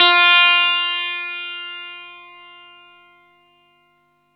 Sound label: GUITAR NOTE F +2, Vintage Blues Guitar; USB Soundscan vol.22